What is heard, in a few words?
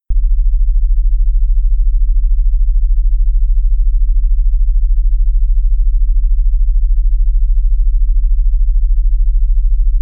beat 27.5 vs 41, Acoustic Beats; mismatched musical frequencies